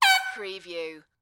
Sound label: Fog Horn, Boat, Short